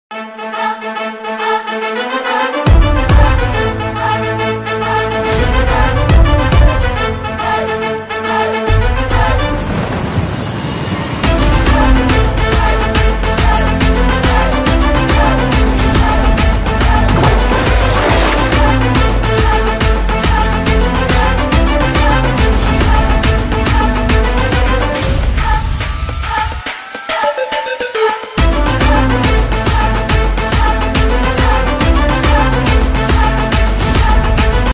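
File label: background music; Pushpa 2; Allu Arjun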